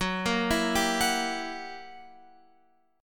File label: F sharp 7th Flat 9th Guitar Open F, chord; F#7b9 arpeggiated